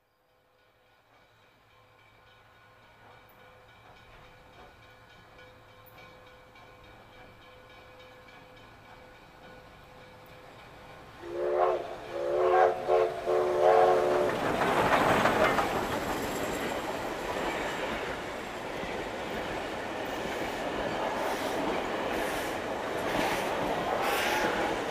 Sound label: Music Concrete